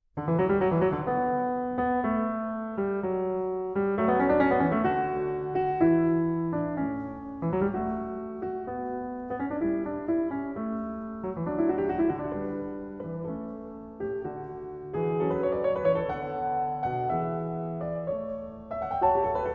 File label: Prelude Fugue in D Major; Well Tempered Clavier Book I No. 5; BWV 850; II. Fugue